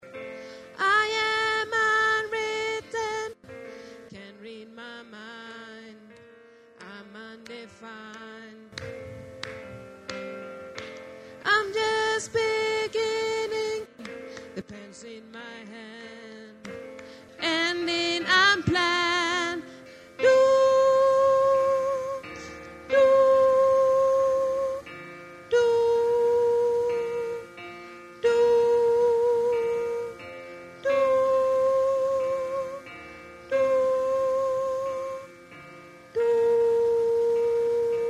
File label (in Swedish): tenor, stämmor